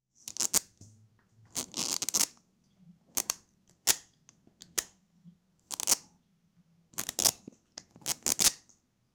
fastening velcro boots

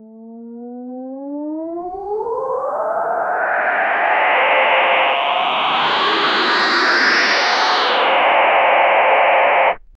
skill charging, power; skill charging inner phys

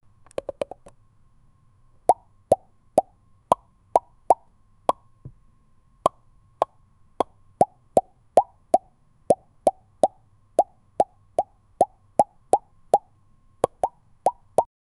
asmr popping sound

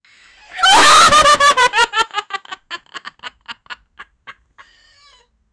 laughing sound, person

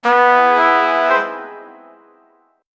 sad trombone sound effect; brass

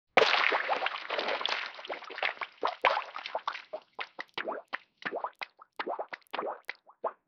action whip crack

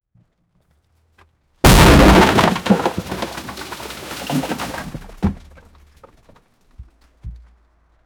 4 bodies being thrown; body throw

